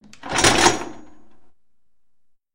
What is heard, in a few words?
Clock Start; Umineko